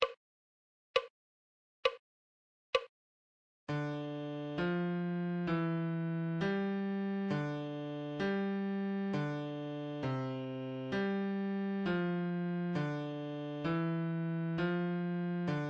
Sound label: musical reading in bass clef Adagio